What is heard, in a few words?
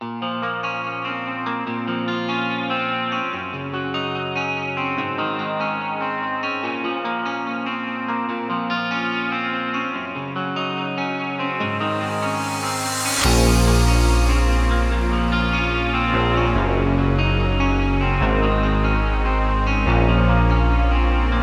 beat